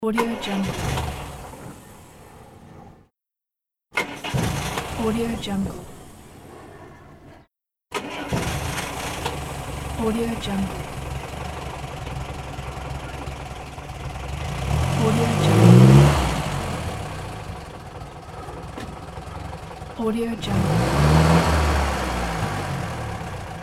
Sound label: old retro car ignition starting